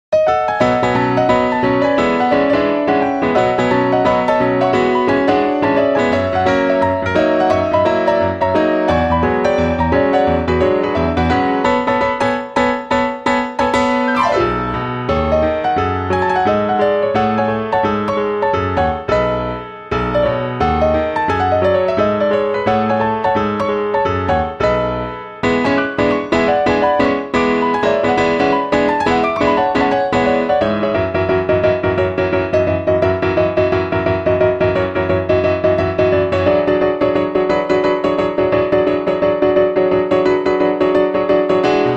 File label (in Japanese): ブギウギ・ピアノソロ